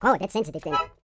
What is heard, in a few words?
YODEL YELL, World Voices